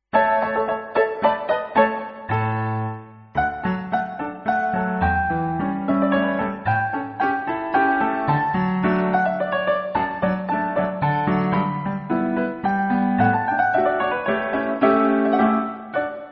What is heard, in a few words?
saute